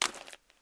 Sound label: mud; firefight